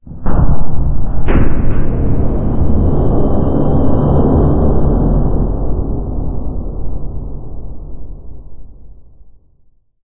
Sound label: amb muffled lo mach